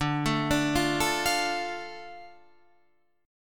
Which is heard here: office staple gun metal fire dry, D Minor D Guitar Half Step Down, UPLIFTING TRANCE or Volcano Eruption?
D Minor D Guitar Half Step Down